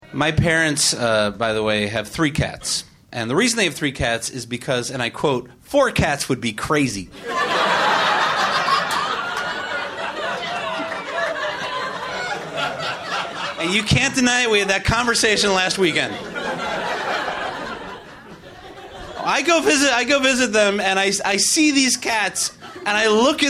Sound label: meow meow meow